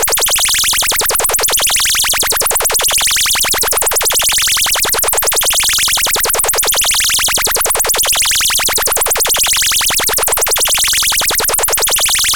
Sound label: mod sources beating, analogsynth, NOISETOASTER